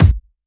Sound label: Bassdrum; Vengeance Samples; VEE Bassdrums